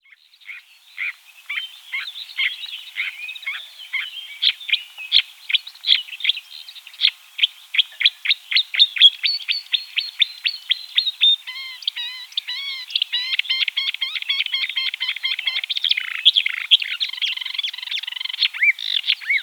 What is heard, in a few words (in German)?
Sumpfrohrsaenger Acrocephalus palustris - Gesang; Bergmann; SUMPFROHRSÄNGER